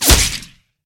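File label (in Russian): молниеносный свист пролетающего предмета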